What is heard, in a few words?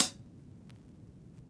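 Vinyl Closed Hat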